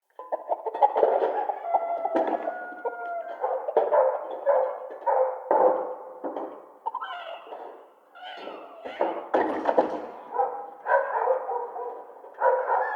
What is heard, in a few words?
Fishing Village Amb Loop; Ambience; Midrath